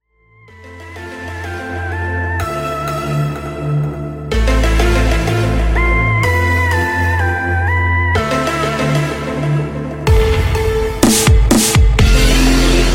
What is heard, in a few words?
Dubstep Remix; Neo Geo